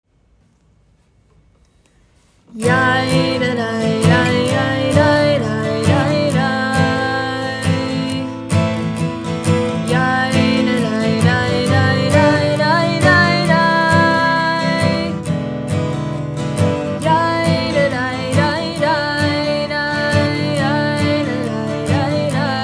Bar chu; Prayers

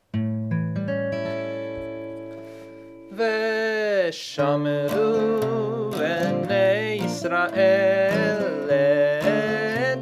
V shamru Low Voice; Hebrew

